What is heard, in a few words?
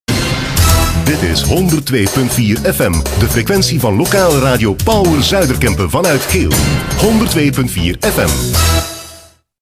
jingle; Power Geel